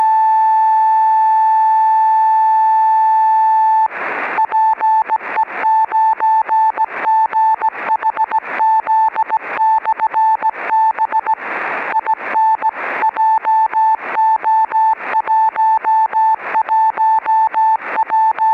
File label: 10GHz PLL VCO beacon